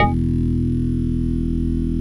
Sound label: METAL BRAS